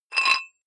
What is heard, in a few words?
dumbbell thread take off weight quick, fitnessstudio